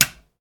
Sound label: Push button on